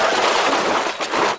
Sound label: vehicle bush; Project Zomboid v41.73